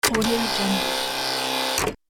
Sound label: typewriter electric carriage reset